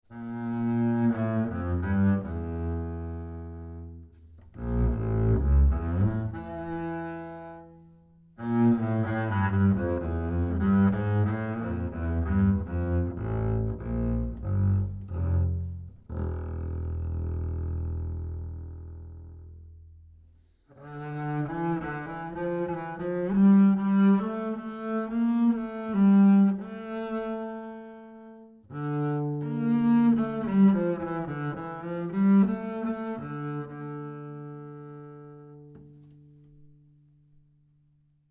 Ac Bass 25cm C Bowed Mid

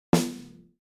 Snare No Comp

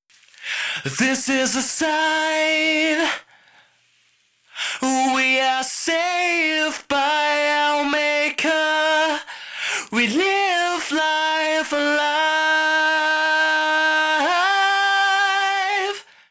Vocal Chorus

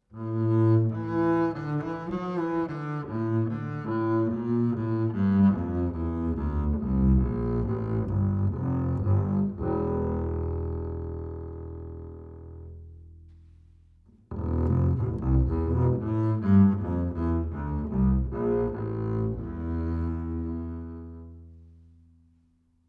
Ac Bass 25cm L Bowed High

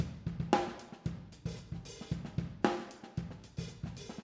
OH Phase Fix

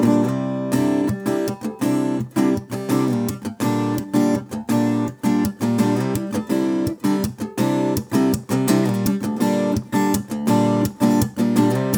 Ac Gtr Phase Rotation

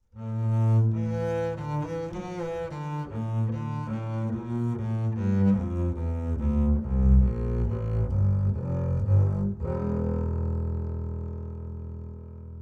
Ac Bass 25cm L Bowed Low

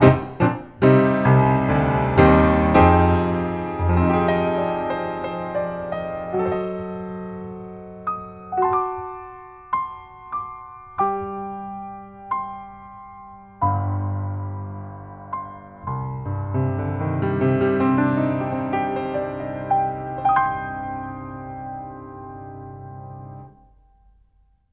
Piano Centre

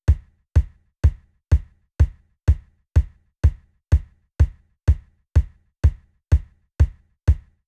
Kick Trans X

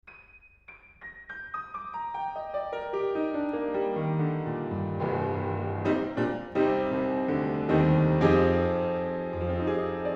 Piano, Vert 235cm Mic

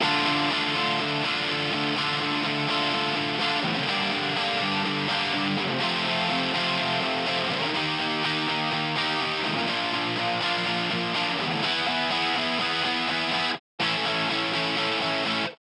Gtr B Proc

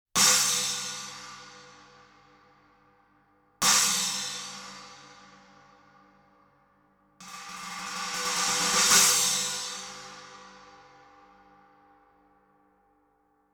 Cymb China Far; Far Mid

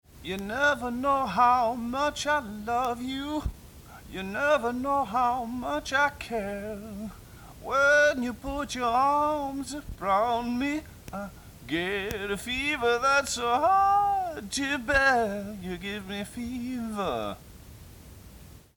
Resonators Vocal Vinyl Flooring Strip Stereo

